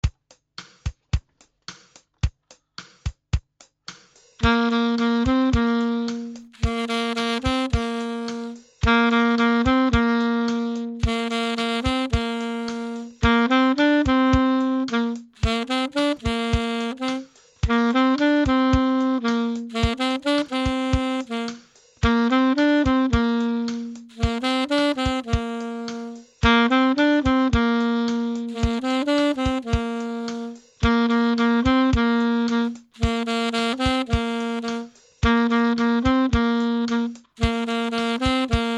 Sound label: alto